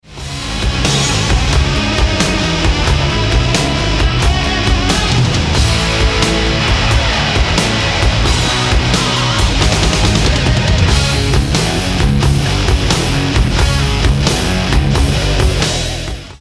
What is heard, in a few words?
Dirty slide